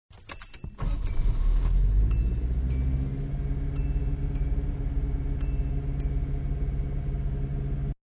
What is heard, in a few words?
Mid Size Car Start Up Interior; Cars (Sound Effects:Vehicles); Manhattan Production Music